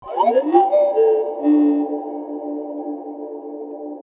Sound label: fx weird ass loop thing